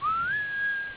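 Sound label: up whistle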